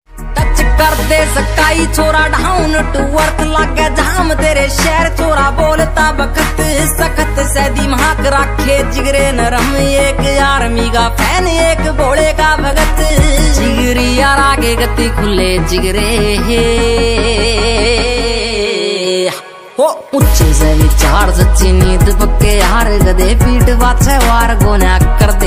haryanvi ringtone

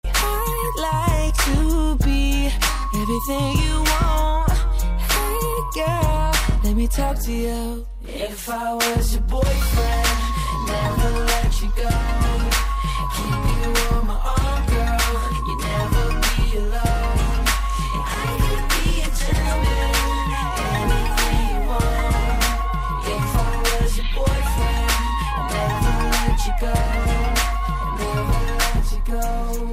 english ringtone

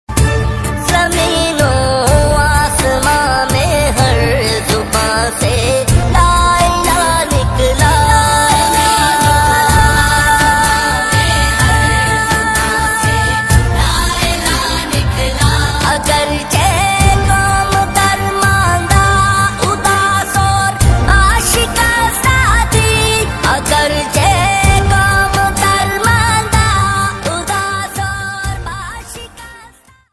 islamic ringtone

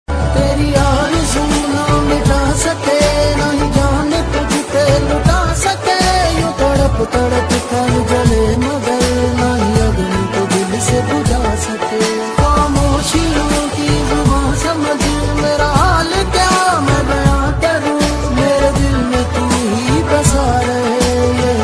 Ost pakistani ringtone

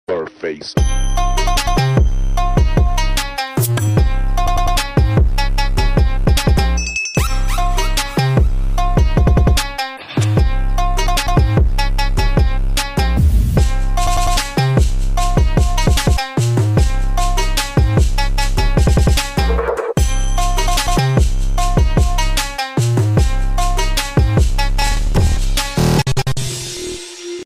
Instrumental ringtone

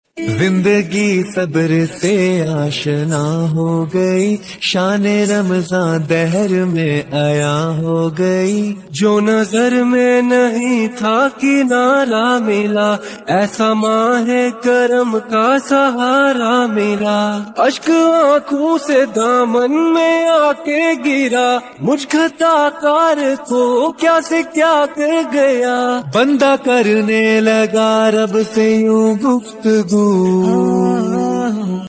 islamic ringtone

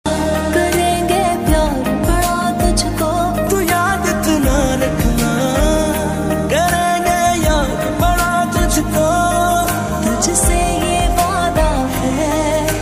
OST pakistani ringtone

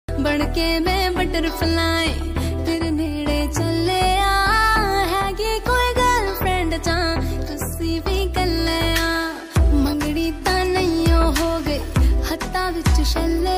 Female version panjabi ringtone

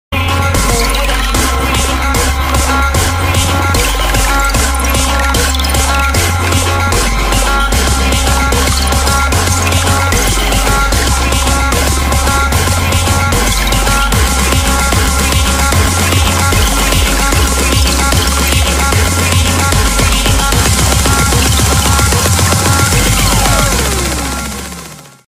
Dj remix bhakti ringtone

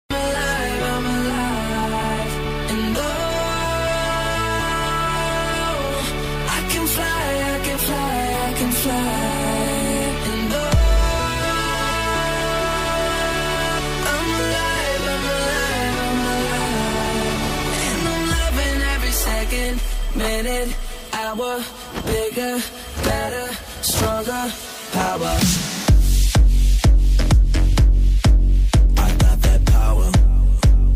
ringtone

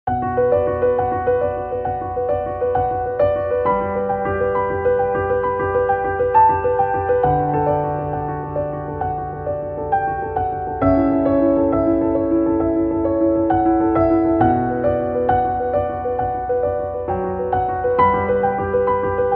Inspirational instrumental ringtone

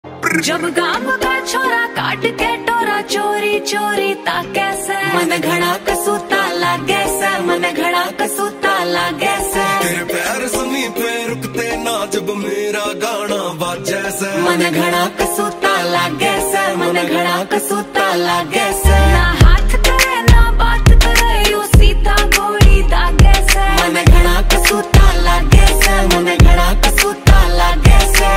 Haryanvi Ringtone

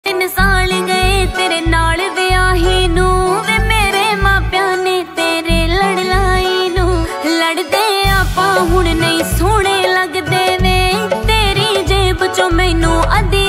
punjabi ringtone

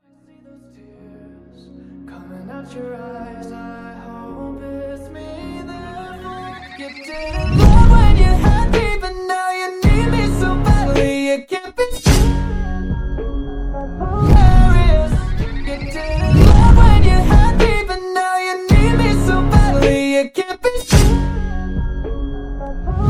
slow reverb ringtone

